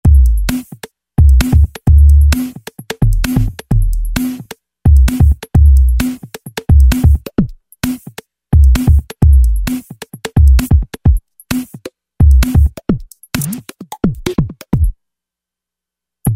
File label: Break Beat KIT, Roland V-Synth; Factory Patches